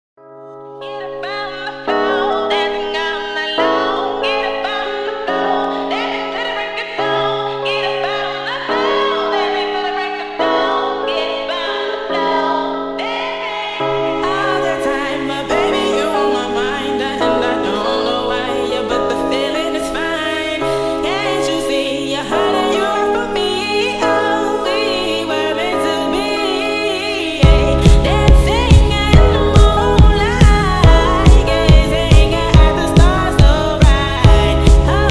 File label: sped up reverb